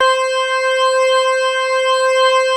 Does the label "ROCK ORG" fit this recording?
Yes